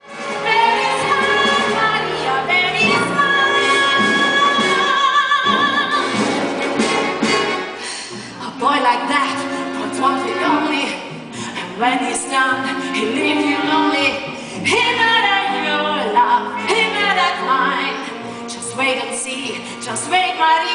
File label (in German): Gesangsprobe; West Side Story